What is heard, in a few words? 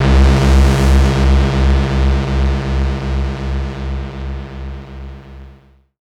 SYNTH